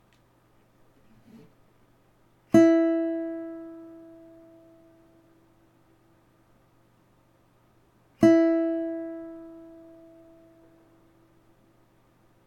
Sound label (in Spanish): Sonido de guitarra española cuerda 1 Mi E; afinar una guitarra